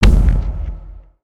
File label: Troll Drum Long